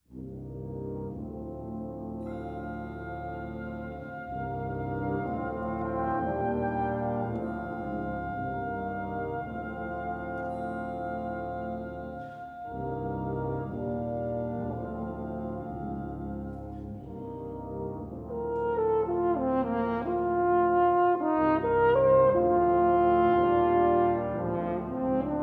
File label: Brass and Percussion